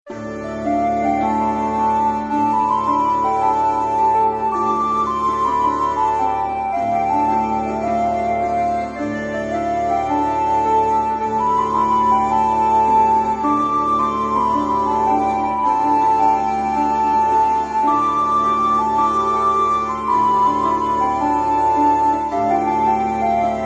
A faded folk song ancient classical music

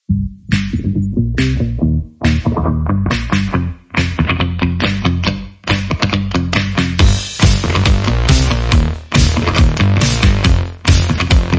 uplifting happy indie pop

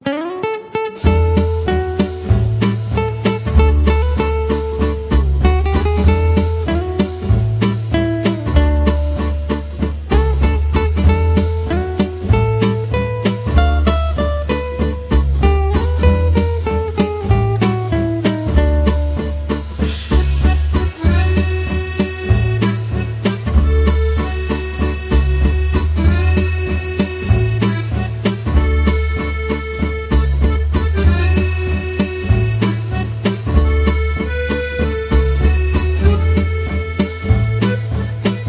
French jazz music

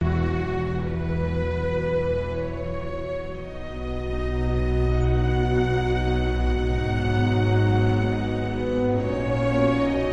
Epic odyssey western classical music

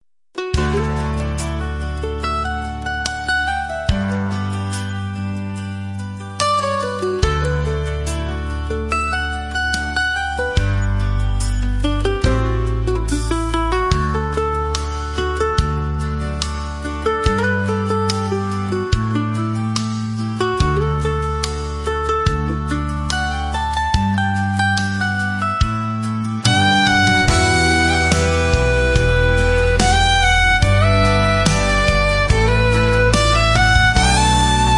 country

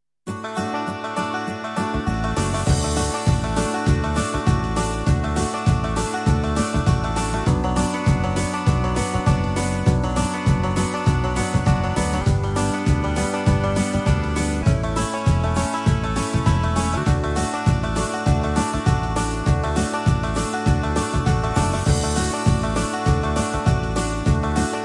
railroad trip banjo and steel guitar train music

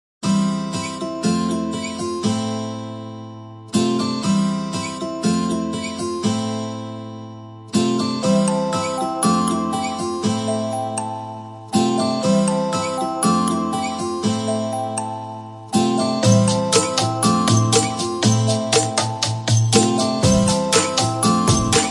Ringtone

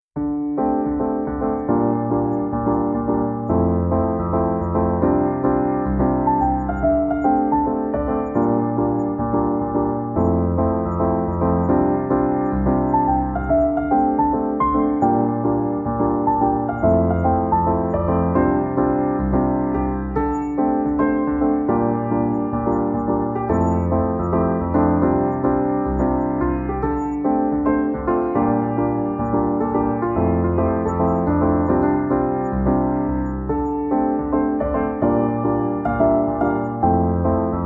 Piano pop jazzy

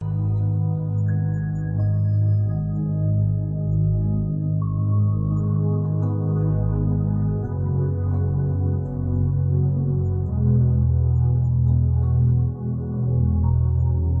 1152 hz spiritual purification

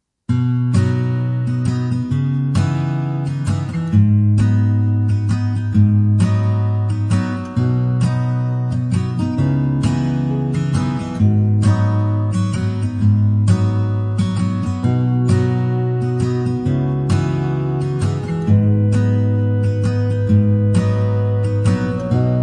Forest time acoustic folk background music

